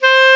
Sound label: KLARINETT, Alpine Volksmusik; Best Service